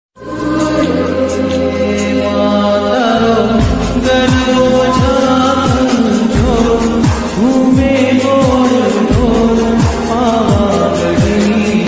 navratri garba song ringtone, gujarati instrumental ringtone